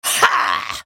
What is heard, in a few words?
effort; Nidalee; Character Voices - English